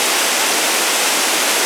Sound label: Waterfall, ambient; Totaled; XBOX